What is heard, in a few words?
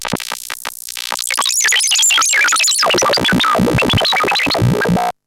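Sample and Hold Filter, SFX; Syntec; Wall of Sounds VOL-2; TECHNO-BOX; 90s sample CD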